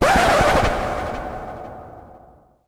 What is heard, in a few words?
stadium music stop